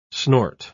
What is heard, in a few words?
snort